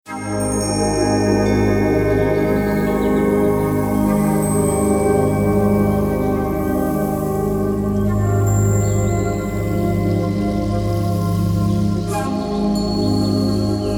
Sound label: peaceful forest